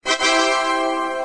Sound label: victory; roblox 2007